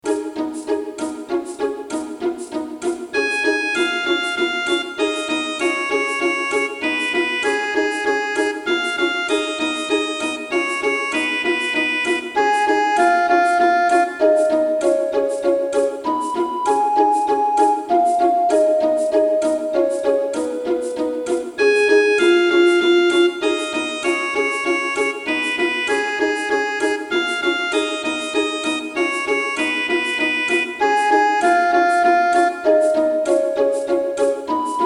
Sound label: Nzakara Harp Songs; Brenner; Vienna 2007